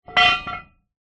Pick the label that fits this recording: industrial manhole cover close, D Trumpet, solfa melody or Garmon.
industrial manhole cover close